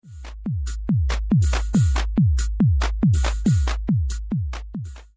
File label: EDS8i, analog drum synth; Creamware Pulsar 2